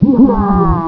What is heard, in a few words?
gurp